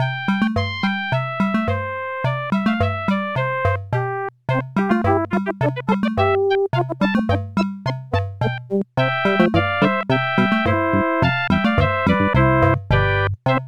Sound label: Car Wash; Putt-Putt Joins the Parade; 3DO, Android, iOS, macOS, MS-DOS, Windows